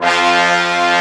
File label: BRASS F2; AKAI S6000 CD-ROM - Ultimate